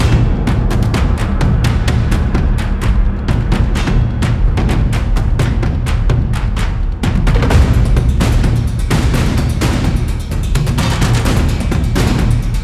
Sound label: Battle Perc Loop